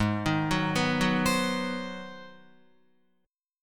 Ab 7th Sharp 9th down Guitar; Ab7#9 arpeggiated; JGuitar

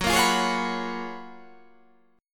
F sharp Major 11th

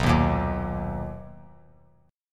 B Major 7th B down; strummed; JGuitar

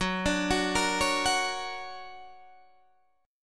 D Augmented Major 7th F sharp, guitar

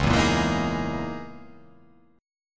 C sharp Minor 6th Add 9th C sharp

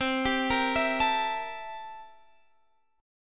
C 13th C

